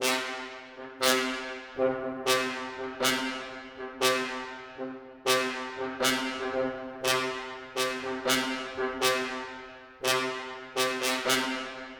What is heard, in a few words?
Staccato Trumpet